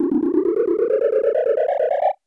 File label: unused jingle; SNES Burn-in Test Cart; Super Scope Test